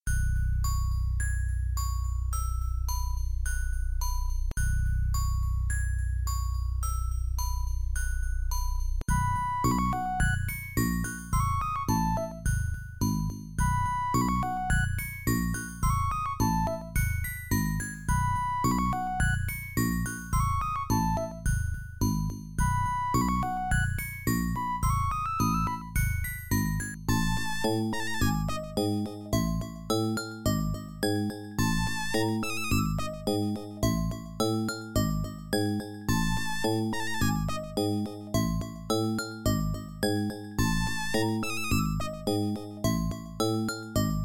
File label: Adlib; Frosty Land 02; Lollypop; MS-DOS